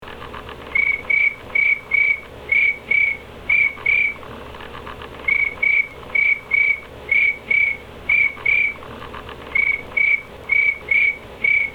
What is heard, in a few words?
Nothing But Crickets